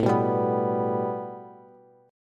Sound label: A 7th Suspended 2nd Bass; A7sus2 strummed